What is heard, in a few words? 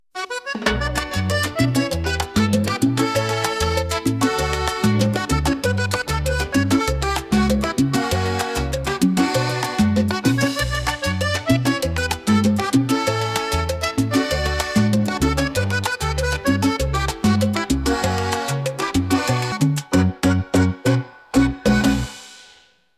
SALSA